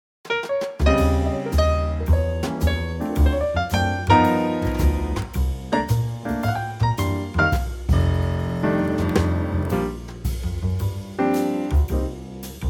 Trio

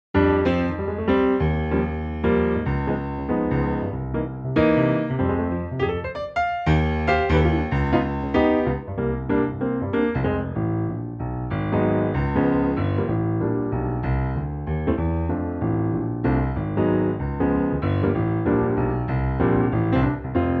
F piano, female backing tracks

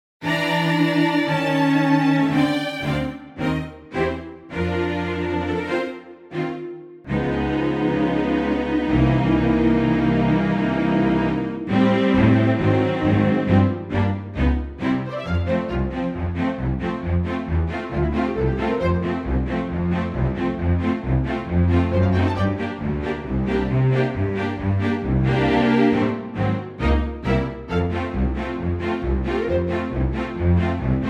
strings F

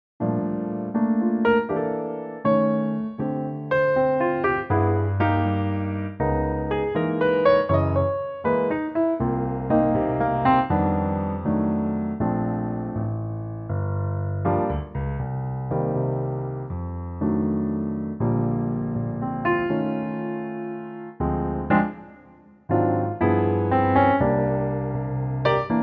Bb piano; Bb to B

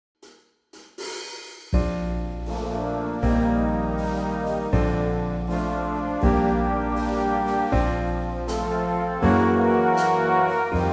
Big Band; Bb

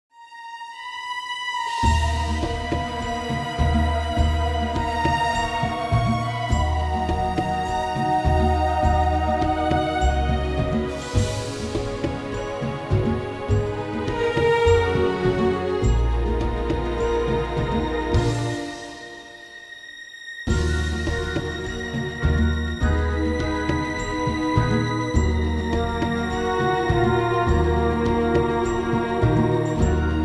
Ebm orchestral; key - Ebm - vocal range - D to Gb

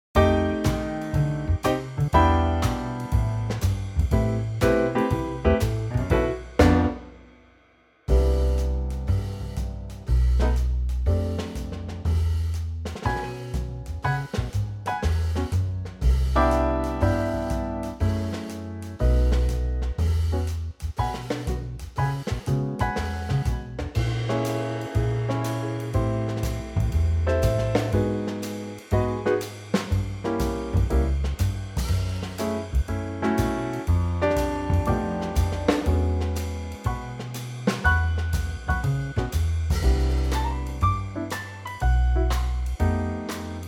E to F trio, backing track; key - E to F - vocal range - B to F

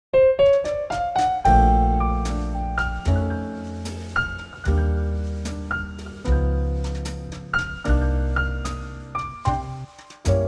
Bb Trio

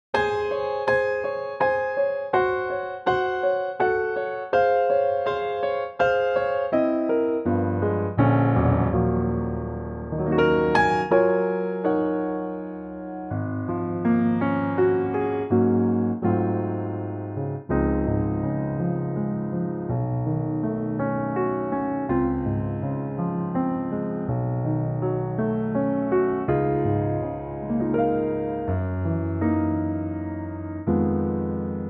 Db piano

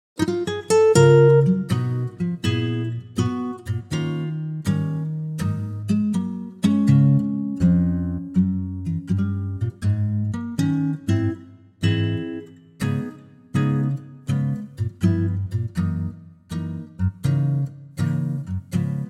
Db acoustic guitar

